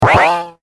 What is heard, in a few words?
slide